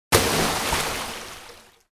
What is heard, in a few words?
Large stone impact splash water